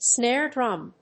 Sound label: snare drum